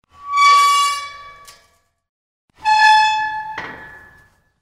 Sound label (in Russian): nemnogo priotkr i zakr vorota